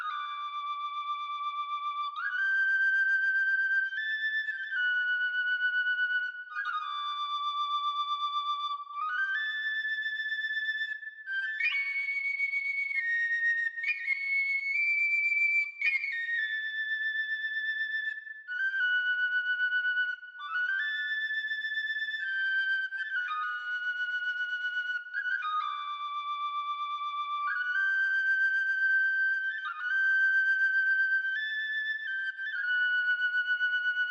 Flute Track - Loop; The Bazaar

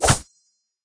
Slashdown; The Denpa Men

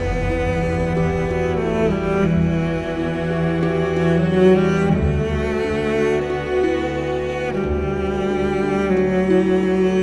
Low Hp; Vendetta Curse of Raven's Cry; Bridge Town

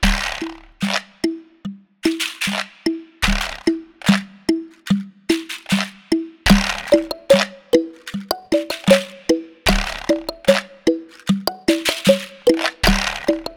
Battle Music; bramble the mountain king; ps4 ps5 switch windows xbox one xbox series xs; Kottefolk

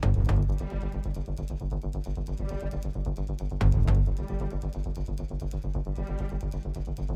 Music Tension Pulse High Tempo; Battlefield 3

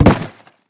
hit wood; Rapids; scrat

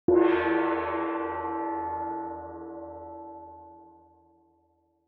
Gong; Split or Steal 2020 gamerip

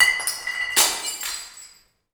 glass break

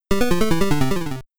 Death (PSG); Knightmare; MSX; Popolon's Death

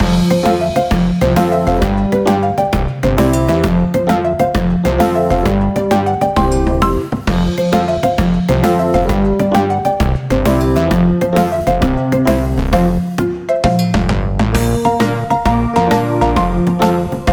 World Map Loop Extended; Baboon

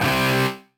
Distortion guitar; Puchicon; dsi ds

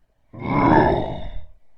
Attack generate

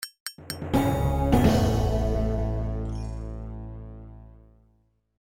Bet Lose; Dian Shi Ma Li

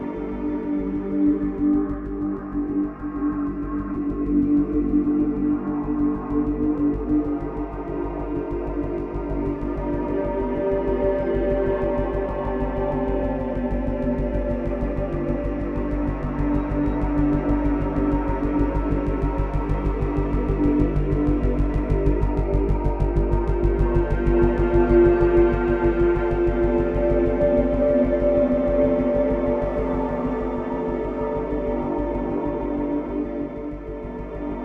Music Loop

